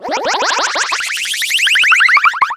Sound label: jump; darwinia